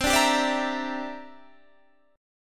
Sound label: G Minor 6th C; Gm6/C; strummed